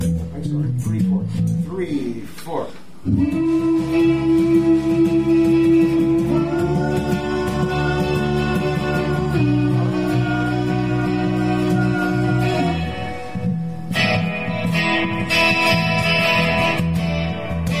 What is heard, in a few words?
Harmonizing